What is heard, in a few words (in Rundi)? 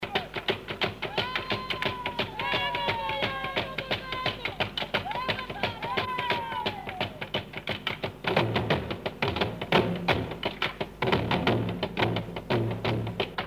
ingoma, burundi